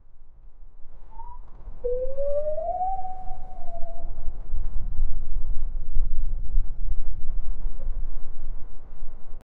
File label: the trickling sound of fl; car horn